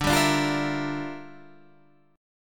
G Minor 13th D

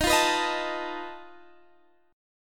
F 7th Flat 5th Eb

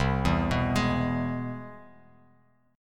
C Augmented Major 7th, Bass Standard; arpeggiated; 8,7,9,13; chord; JGuitar

